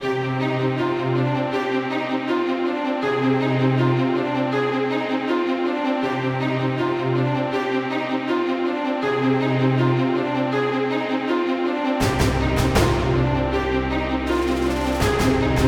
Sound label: Epic Cinematic Trailer; Clone Drone in the Danger Zone